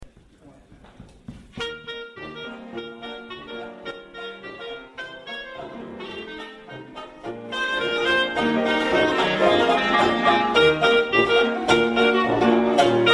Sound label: Dixieland Band